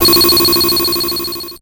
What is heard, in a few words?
Title Transition Digital Readout; Production Element Title Transition